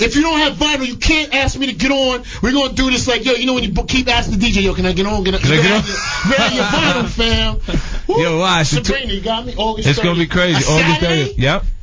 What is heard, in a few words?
flex, drums